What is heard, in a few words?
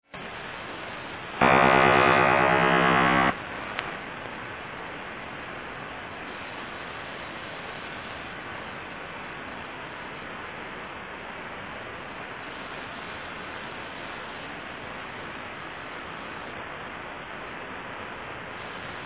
Ionospheric Sounder or OTHR Radar